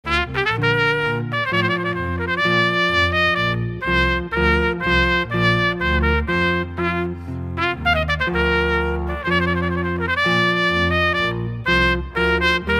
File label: Trumpet Voluntary; Clarke